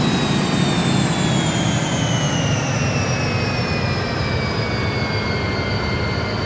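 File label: vehicle speeder decel; flashspeeder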